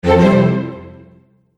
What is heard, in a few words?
Ta-Da Strings Fanfare; Cartoon Sound Design Sound Effects